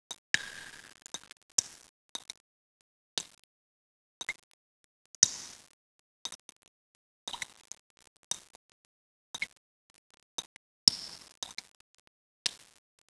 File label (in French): egout; de dockland